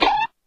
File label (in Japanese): 驚き